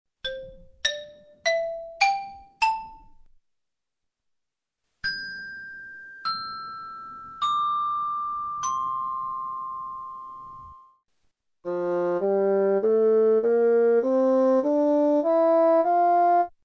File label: scale test; ascolto selettivo